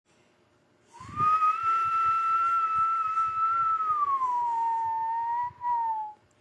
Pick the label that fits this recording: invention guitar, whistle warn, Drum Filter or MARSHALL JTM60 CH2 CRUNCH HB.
whistle warn